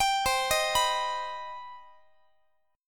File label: C Minor Major 7th, Guitar; arpeggiated; 4A,4E,5A,5D,5G#,6C#; x,x,22,22,19,22; JGuitar